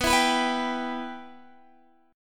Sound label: B Suspended 4th Sharp 5th, Guitar, Open D